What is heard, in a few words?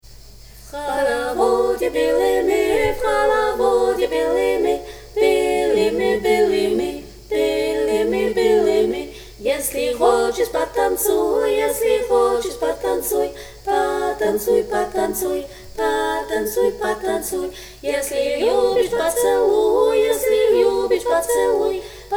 tutti